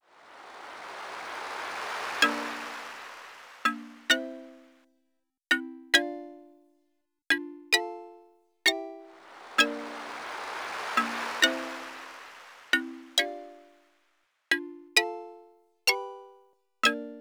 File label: xylo with waves